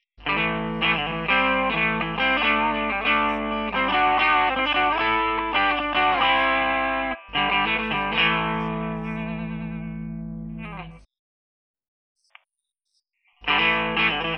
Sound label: transfet booster, guitar